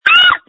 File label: screams